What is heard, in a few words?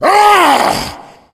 tank fire; Left 4 Dead; Source Engine